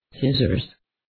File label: scissors